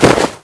snowman dash; The Monster Plane; cameraman ranged

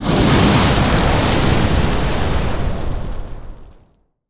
fire breathe; saxosaurus; pianosaur curse

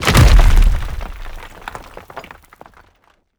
rock impact big; skibidi critical dumpster; Skibidi OCTOPUS

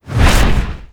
melee miss; gnarr; The Monster Plane; kolmods

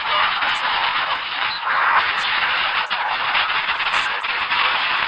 static; Garry's Mod; huggy wuggy snpc; slender; corrupted woody

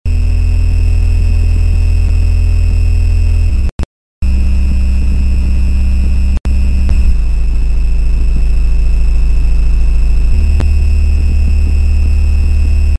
panic; Garry's Mod; sprunki horror; horror sprunki gray

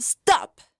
Angry

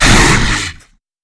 pyro attack; The Monster Plane; kolmods preatt

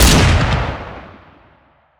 fire; squid game pro jjk; squid game doll

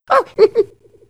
hunter stunned; huggy wuggy snpc; elmo

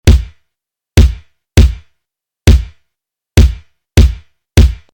panic; Garry's Mod; sprunki orange

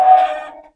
pain; zoochosis

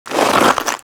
ice blast tick; ice tower pack

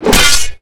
dismember swing; The Monster Plane; kolmods melee

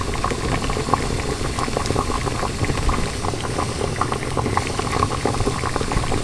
boil, lava; cauldron frozen lava pack

